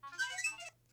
motorola krzr k1 ringtones

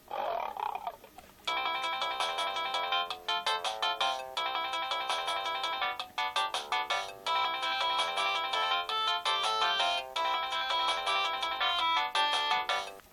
samsung sghe700 ringtone

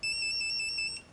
nokia 3210 mosquito ringtone

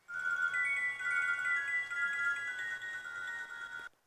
nokia 1110 ringtones elves

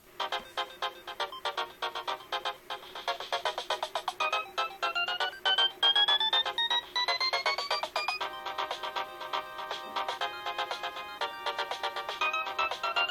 samsung sghe700 ringtone

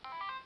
motorola razr ringtones chord high

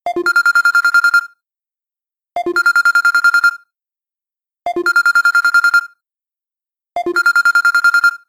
samsung galaxy s2 basic bell ringtone

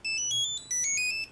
nokia 3210 happy return ringtone

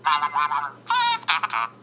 robot ringtone; apple iphone 3g 4

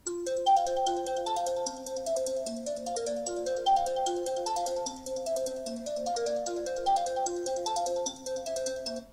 apple iphone 5 night owl ringtone

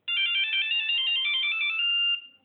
nokia 1100 going up ringtone

